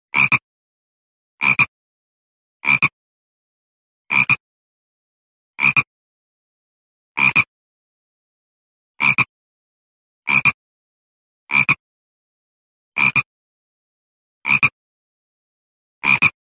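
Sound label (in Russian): jaba kvakushka, croaking toad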